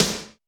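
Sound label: Snr Media, snare; Alesis D4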